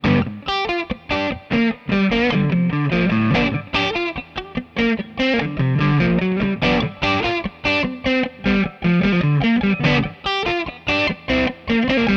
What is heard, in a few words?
Sleek 357 hum hurf tone full